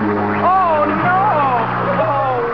wrong

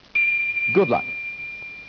timer

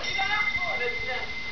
ting; france

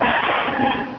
lion beams

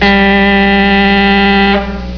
time up

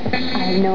outta time

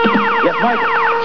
buzzin; usa

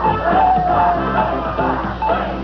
bigwheel; italy

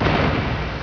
lose money; usa

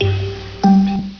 tick

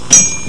answer

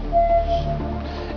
choices; france

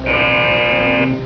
buzz in; usa

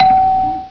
buzzin

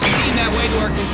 whiplash

